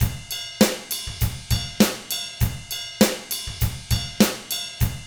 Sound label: ROCK, Drums; ILIO; Double Platinum Drums 1